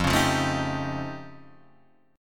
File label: F Augmented 9th F, chord; F+9 Chord {1 4 3 2 4 3} strummed